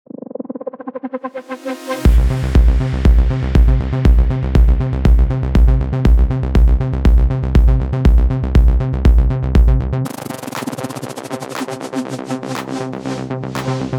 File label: melodic techno; Solid Sound Presets